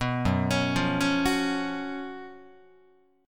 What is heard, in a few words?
F sharp Major 7th Suspended 4th, Guitar; F#M7sus4 arpeggiated; 2,2,4,3,5,5